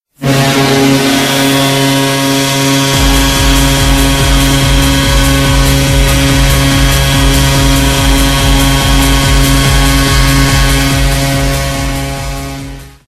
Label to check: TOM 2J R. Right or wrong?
Wrong